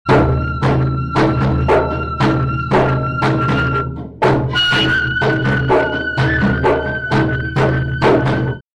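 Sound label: wa rhythms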